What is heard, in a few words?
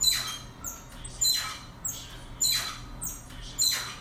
noise sample